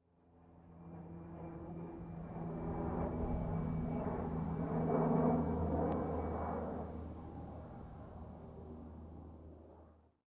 airplane, ambient

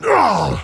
pain sharp; garrosh

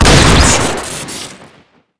shoot crit; weapons; bolverk

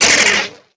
remote fire; blaster

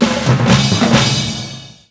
promote

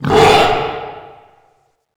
tunnels amb alert; slender; amachineforpigs; wretch

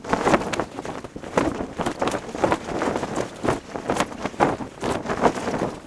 flag, ambient

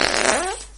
fart; redsun; coins; farts

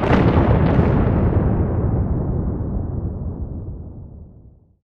distant explosion, ambient

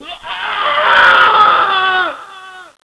scream

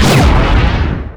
tank fire

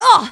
scout pain sharp; femscout

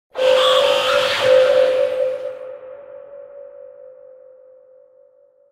intercom start; csgo; eternity; scp

